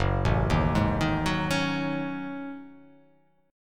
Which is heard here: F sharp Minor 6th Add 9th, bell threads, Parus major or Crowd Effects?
F sharp Minor 6th Add 9th